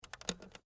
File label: HD Camera Switch, Technology, Hd, Camera, On, Switch, Hvr-Z5; Sony; Camera Sound Effects